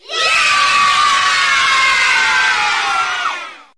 "yay", kids cheer

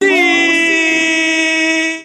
eee, Voice

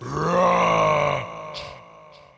growl, Voice

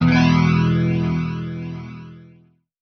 bomb, Guitar; Notes

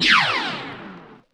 knock box; Zaps and Beeps

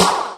Snares Real

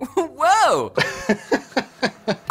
uh huh woah laugh; Voice

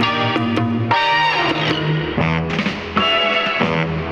horns and sax stabbers, Loops, Phonky

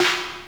Snares, Real

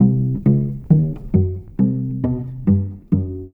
bass

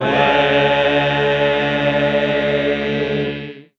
"wayyyy", Voice

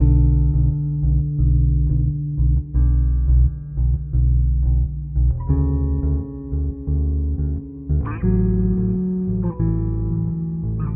bass, Loops

